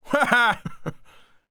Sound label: taunt hehe